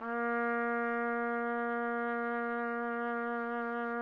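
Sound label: trumpet; Roland - LA Composer vol. 3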